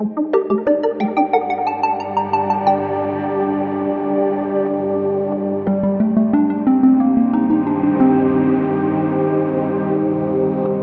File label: Loop 180bpm; jenny leclue detectiv